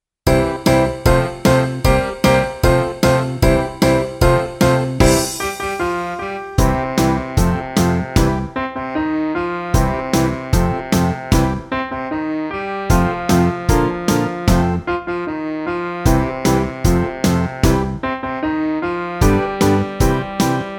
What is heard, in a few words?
Rockhouse Blues